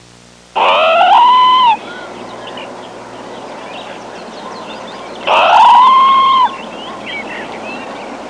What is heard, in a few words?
Jungle parrot squawking closeby